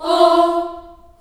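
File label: F3 Choir Children Ohs, Choir Acoustic & Synth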